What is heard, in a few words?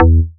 Emission House Bass; molecules-and-light